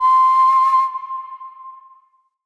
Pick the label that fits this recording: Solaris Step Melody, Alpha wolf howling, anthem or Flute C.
Flute C